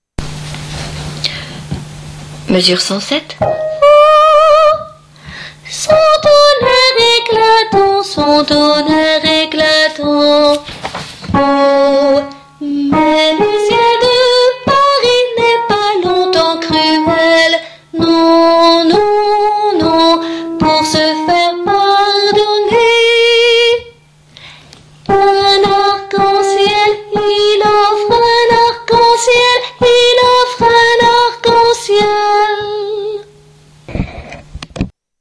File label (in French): sous le ciel ALTO; suite et fin mes 107